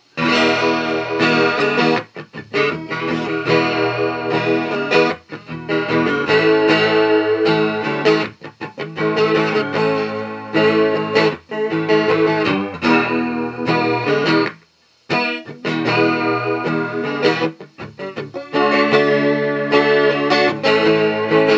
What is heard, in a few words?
Guild G600 Aspen Rototron; amplifiers